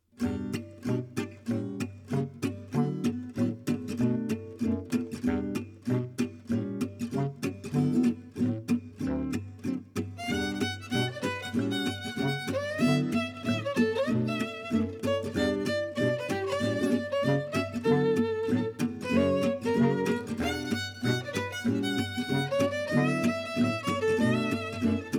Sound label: Manouche; Perec B.O.